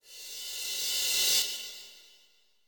Cymbals Reverse Crash; Vengeance Samples

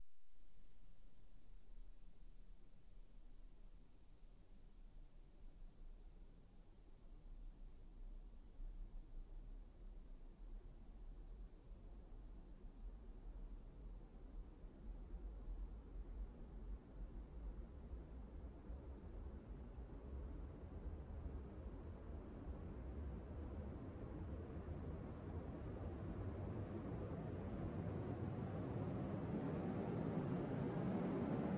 Long Sweep 139BPM; Sound FX; G#minor

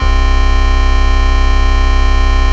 Basses C; Vengeance Samples

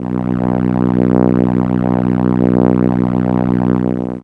Contra B1, ARP Solina, Contra Bass; musicradar-synth-samples

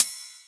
Open Hats, Cymbals

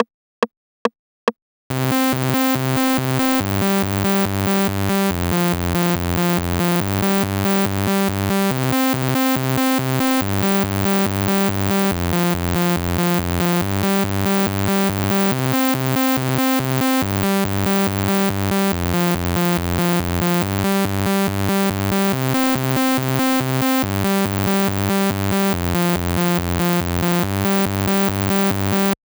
Saw, 141 BPM; Vengeance Samples; Once Upon A Time

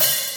Open Hihats, Cymbals; Vengeance Samples

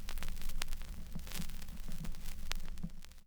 Record Start, Vinyl Record; Record Noises

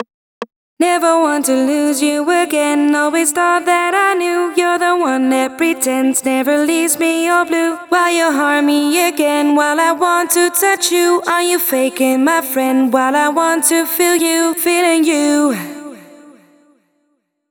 Vocals FX, 143,5 BPM; Vengeance Samples; Blue

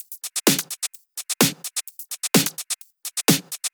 Drumloops 128BPM

